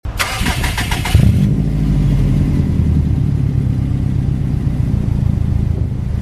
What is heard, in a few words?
startup, vehicles